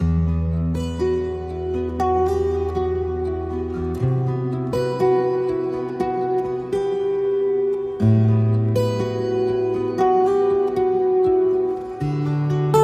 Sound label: Guitar